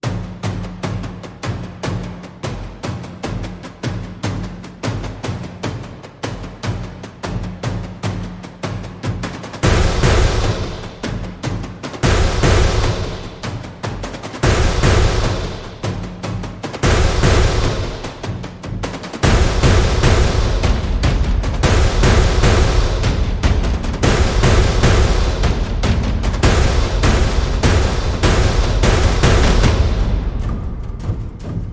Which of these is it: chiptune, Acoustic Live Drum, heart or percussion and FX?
percussion and FX